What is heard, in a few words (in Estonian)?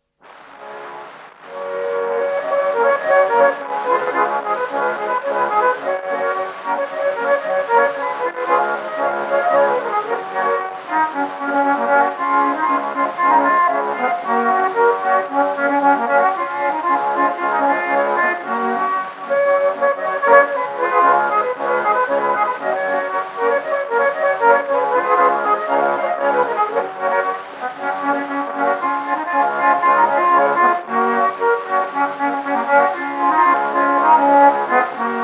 polka, loots folk; Kirbla; Kilu Mari